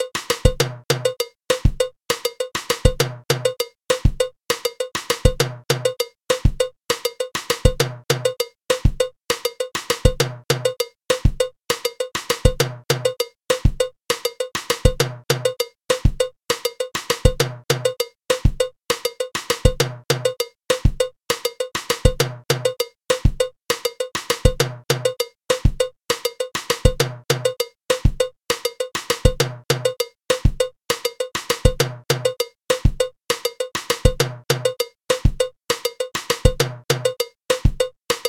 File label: timba songo laye timbales